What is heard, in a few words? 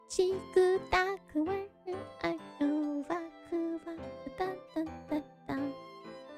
Singing; IRySinging